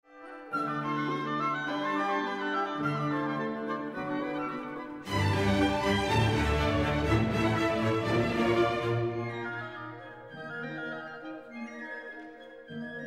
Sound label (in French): danse vif lent